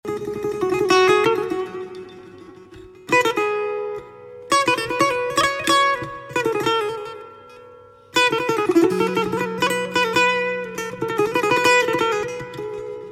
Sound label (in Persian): Taknavaziye Setar